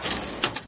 take out; There; ThereClient

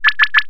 Signal; To the Moon

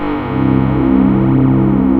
Synth Note; One Shots; Instrument

